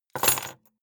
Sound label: key ring large place table hard